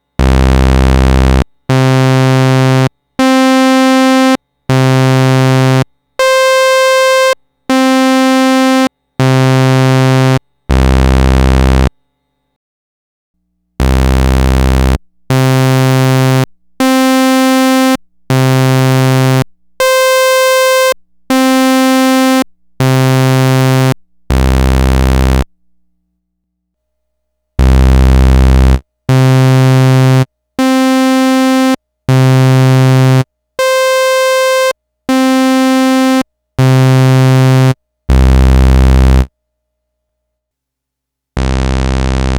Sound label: saws full